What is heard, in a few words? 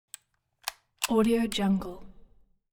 assault rifle chamber load